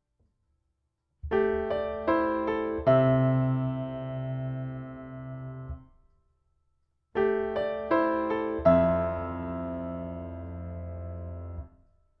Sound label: Piano Ribbon